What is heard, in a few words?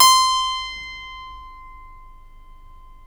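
C5 clavinet left, Keyboards Acoustic; USB Soundscan vol.17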